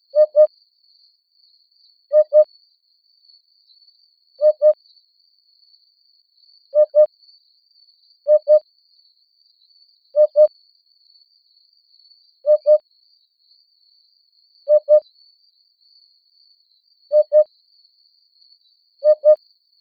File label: Upupa epops